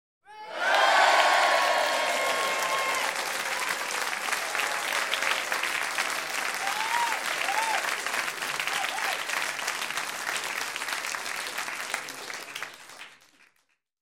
Light Entertainment Audience - Cheer Into Applause; Rec. At Bbc Paris Theatre